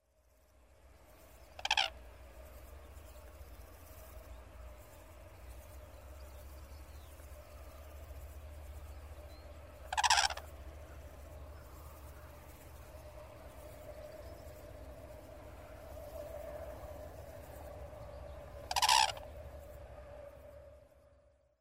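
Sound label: Red-crowned Crane, doto; タンチョウ; 警戒？; 北海道東部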